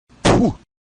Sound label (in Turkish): tukurme sesi puh; sonmez reis